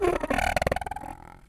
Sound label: Monster Noise; BB Retail Therapy Sample Pack